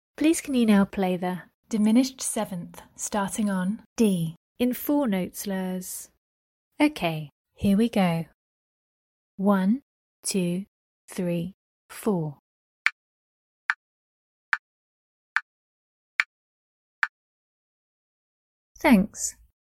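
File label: diminished 7th starting on D 4 note slurs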